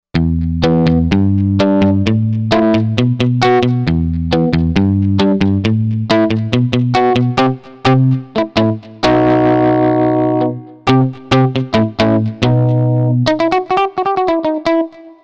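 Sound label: Guitar Distort Mute, synth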